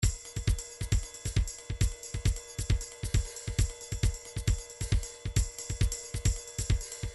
trance midi loops beats; Newtronic Trance Constuction Kit; diddles

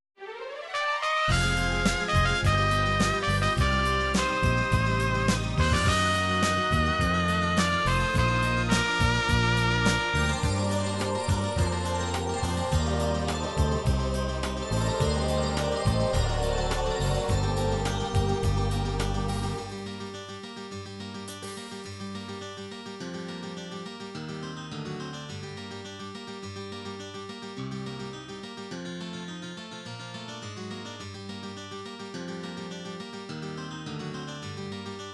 russian ukrainian, midi

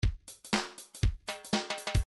reggae 1 bar

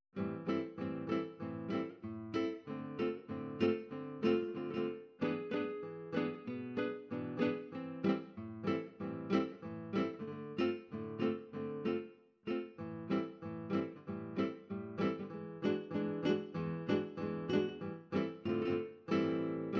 jazz, midi

